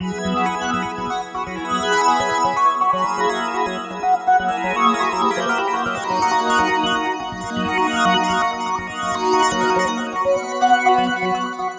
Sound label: Organ